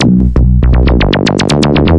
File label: hard zappy bassline